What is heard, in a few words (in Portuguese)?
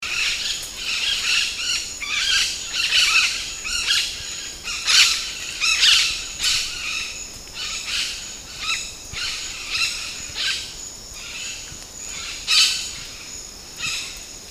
canto da maracana de cara amarela